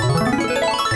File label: Spin, Gamble